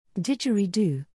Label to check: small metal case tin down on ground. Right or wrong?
Wrong